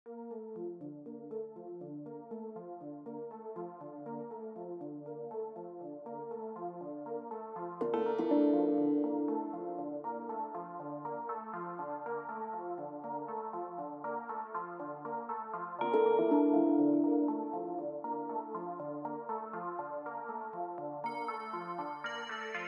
SYNTHS

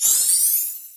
Exp Get; SE Sys#05 (SE Sys12 Exp Get)

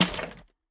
Weapon Electronics

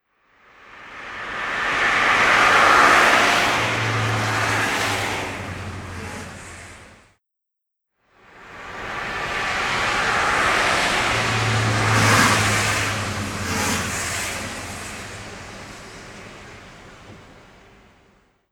Gateway Arch Car

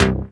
Synth

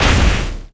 Sp Fist ball Hit; Se Sp Fistball Hades Hit

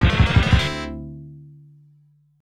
Coin

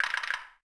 Crab clik

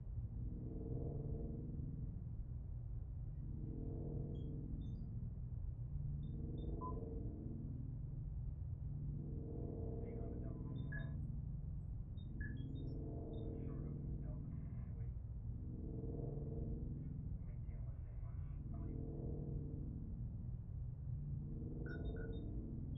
hangar background

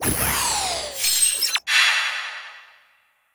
League Rankdown; disney star smash android ios mobile gamerip 2020

